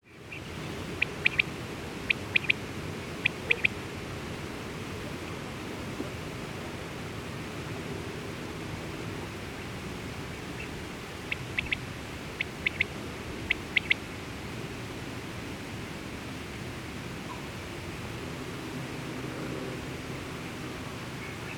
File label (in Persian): صدای بلدرچین معمولی